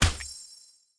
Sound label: Lubberkin Damaged; Valthirian Arc: Hero School Story 2